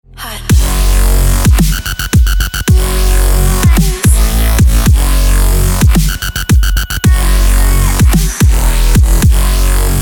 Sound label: hot funk